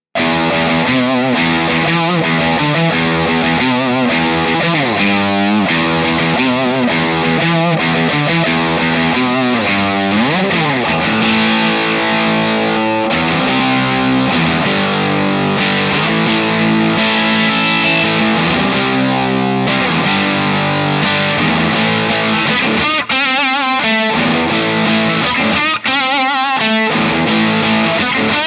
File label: characteristic sound; jensentone